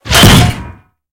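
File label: tank ricochet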